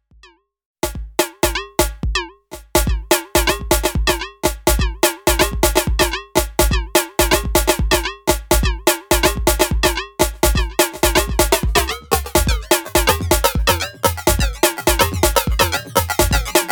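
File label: Roland TR-8S TR-6S Delay Pitch Shift; Firmware Update V2.5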